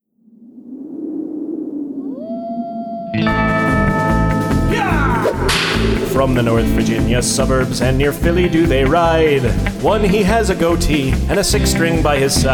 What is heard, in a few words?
Theme Song